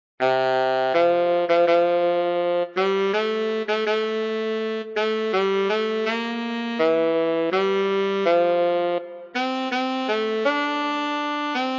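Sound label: solo tenor saxophone; O Tannenbaum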